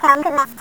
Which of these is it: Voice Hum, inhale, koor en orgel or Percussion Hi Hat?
Voice Hum